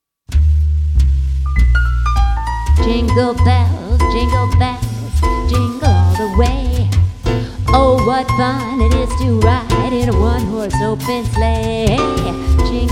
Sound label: jingle bells